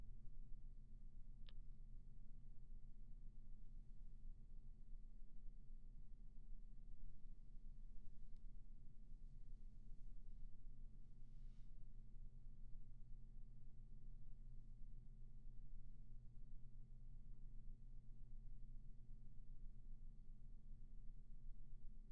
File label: Roomtone